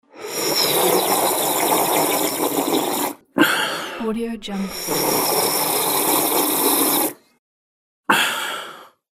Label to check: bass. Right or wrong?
Wrong